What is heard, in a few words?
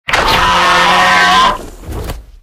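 Sinomacrops sound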